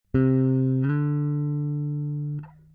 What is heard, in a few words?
Slide